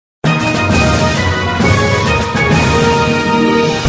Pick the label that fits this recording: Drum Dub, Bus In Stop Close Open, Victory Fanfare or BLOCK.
Victory Fanfare